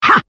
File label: "ha", Voice